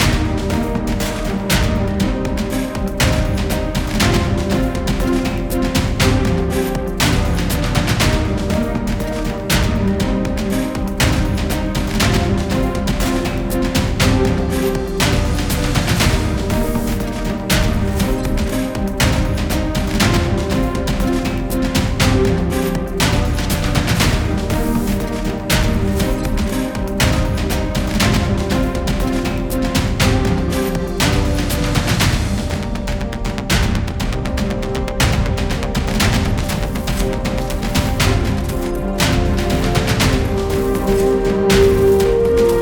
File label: mountain combat, 120 4 4; Rogues Like Us